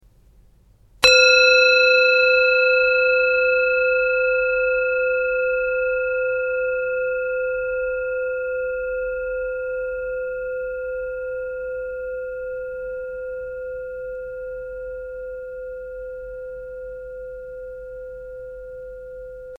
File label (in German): Klangschale